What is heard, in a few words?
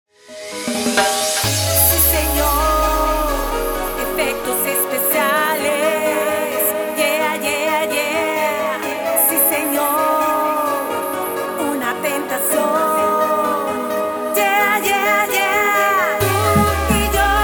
intro outro 130 bpm